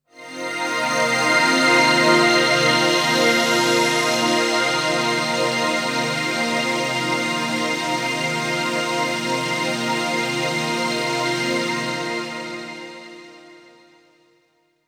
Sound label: pads triton Cmin7; M8; M8JUNGLEPAK1